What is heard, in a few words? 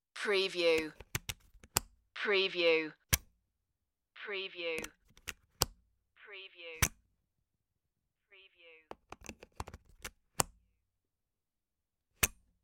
plug unplug